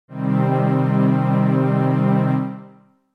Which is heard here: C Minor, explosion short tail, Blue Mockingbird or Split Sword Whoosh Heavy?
C Minor